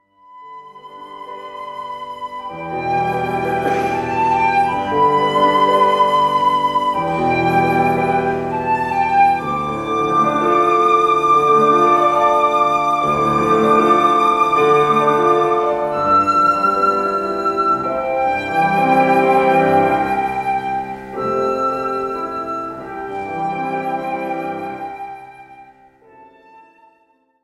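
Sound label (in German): Ständchen piano; Schubert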